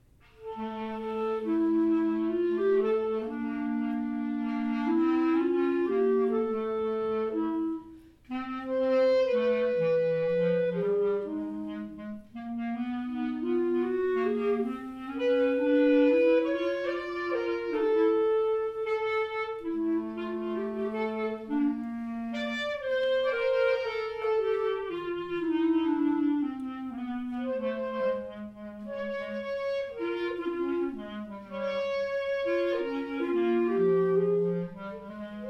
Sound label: Two Clarinets, recital